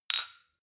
shot glass clink bounce medium; soundpool

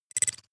noise flick splutter; maschinen; signale; soundpool

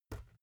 basketball catch light; sport-freizeit

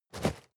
cardboard box medium shake single; objekte

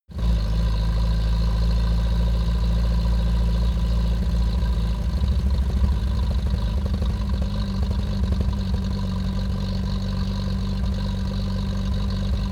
car uaz 469 idle

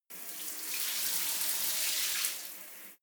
shower short sequences; haus garten; badezimmer

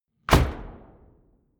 sci-fi shot tonal small; schusswaffen

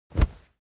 whoosh cloth soft dirty; swooshes-swishes

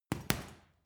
boxing punching bag hit double; sport-freizeit; boxen

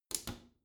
bathroom cabinet close soft; objekte; tueren

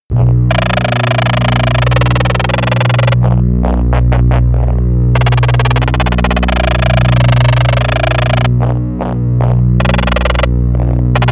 drone busy phone in hell

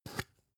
spice jar big scratch open

